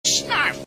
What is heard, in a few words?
snarf